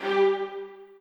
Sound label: strings